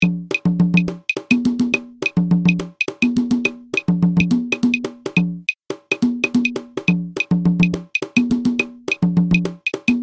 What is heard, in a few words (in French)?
guarapachangueo cajon clave; clave 3 2